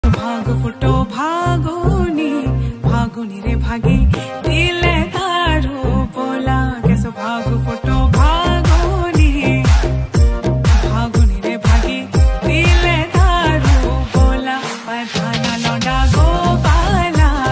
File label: uttrakhandi ringtone